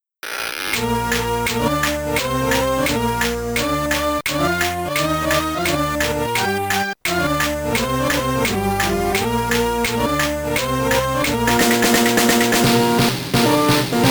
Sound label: Ten Green Bottles, Macintosh II; lemmings